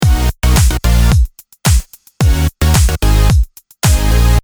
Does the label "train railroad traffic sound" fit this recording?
No